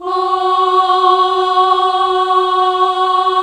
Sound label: CHO G3, CHOIR FEMALE